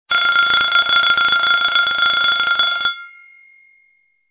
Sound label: alarm Clock Bell